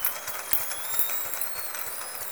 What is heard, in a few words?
call, Orca; SRKW; Alwin